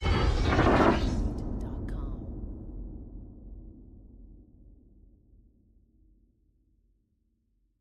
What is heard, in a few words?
organic alien impact; soundscrate